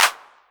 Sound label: 808 Clap; Novation Drumstation